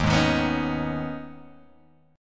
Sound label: Eb 7th Suspended 4th Sharp 5th, Guitar Open G; down